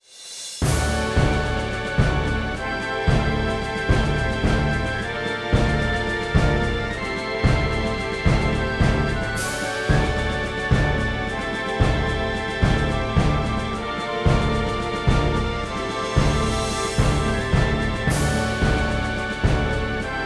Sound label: you lose